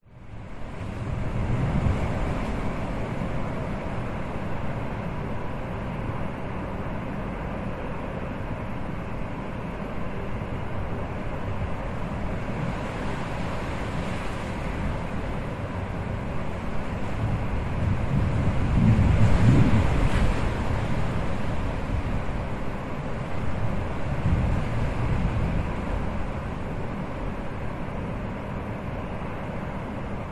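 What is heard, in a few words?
nature wind blustery against window slightly open occasional howling gusts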